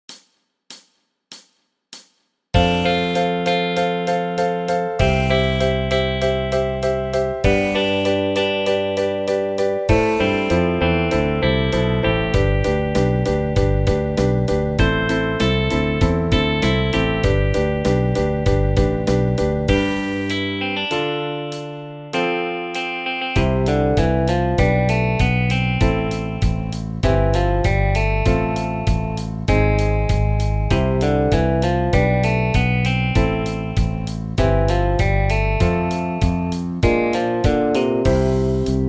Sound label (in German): mit Bass